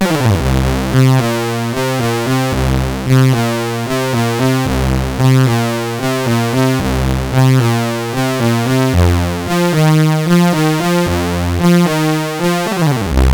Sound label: Funk Rock BASIC, CSG8580R5; hvsc